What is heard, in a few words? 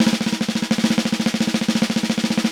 Snare Drum